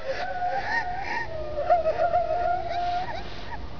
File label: wispers voices